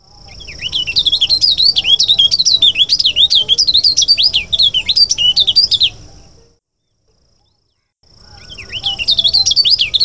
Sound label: prinia burnesi